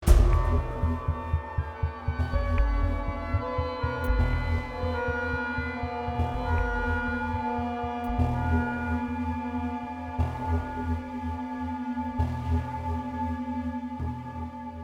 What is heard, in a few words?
Music transition music segment Post Fight; Sifu; FinalKick